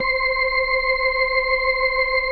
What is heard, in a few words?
C5F, B3